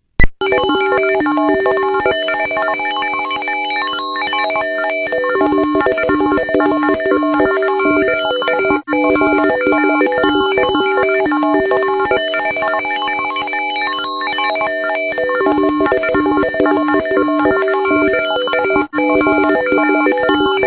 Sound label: pulse